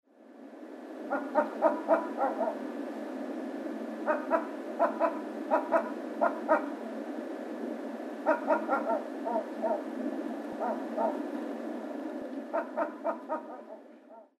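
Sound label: hashibuto, Large-billed Crow; sapporo; koe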